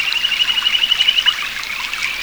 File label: CRICKETS, JUNGLE; Spectrasonics; Heart of Africa 1; JUNGLE FX